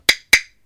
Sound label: Wood Bloc